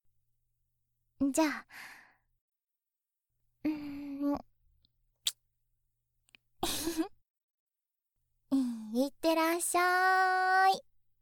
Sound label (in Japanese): キス